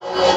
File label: Robot; SFX; 7rystan's 700+ FREE COLOSSAL EDM SAMPLE PACK